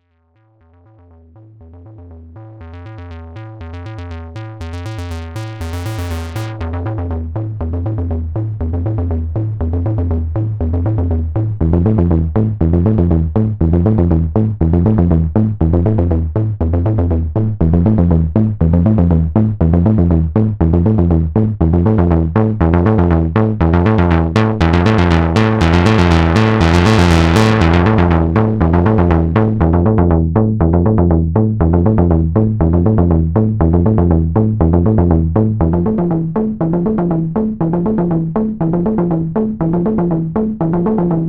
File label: Roland SH 01A Test Seq